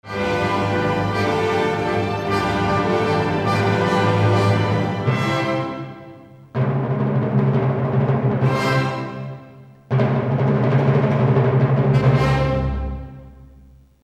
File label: Drum roll; Roeder